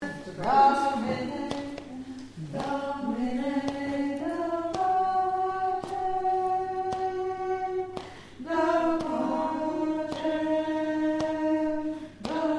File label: Alto